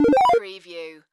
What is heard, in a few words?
Chime Musical Fast